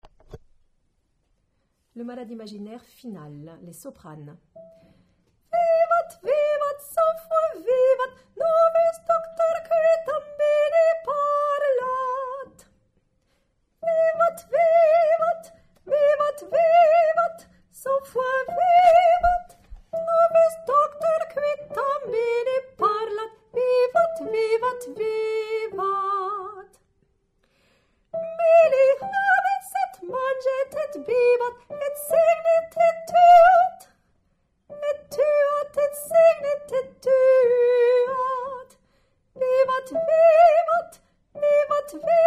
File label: Soprano; Le malade imaginaire; Molière